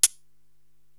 HH Spray Can Close, Korg TR-Rack drum machine

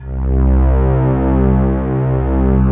ARCHAICOL, Ambient Atmospheres

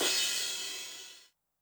crash cymbal Low, 909; classic machines; 909mod; CC - CRASH CYMBAL

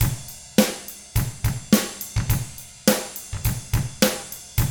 105 POP RK, Drums; ILIO; Double Platinum Drums 1

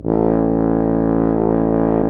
TUBA; Sound & Vision - Gigapack I CD 2 (Roland); Brass&Woods2

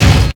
Kick, Drums Percussion; Zero-G; Total Drum Bass

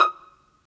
Pizz, String; Vlas Pizz; Vas Pizz; Roland - String Master Series

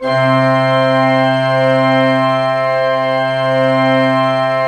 PLENO C3, Cathedral Organ, PEDAL PLENO; Propeller Island

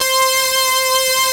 BIG POLY SAW, SYNTHS; Pro-Rec - Synthsonic

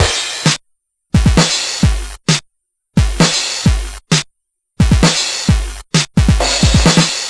Game Boy Progressive Rock Loop, Drums

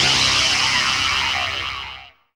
RAVE, RAVESYN

